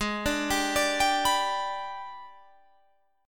Gsharp Minor Major 7th Flat 5th, Guitar, Drop D; 18,17,17,19,20,19

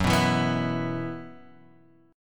F sharp 7th Suspended 4th, guitar; F#7sus4 Chord {2 2 2 X 0 0} strummed

